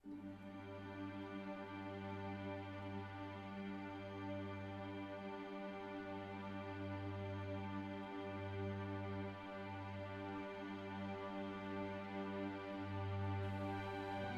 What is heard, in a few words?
Menu Theme Darker Version, Strings and Harp Stem; ps4 ps5 switch windows xbox one xbox series xs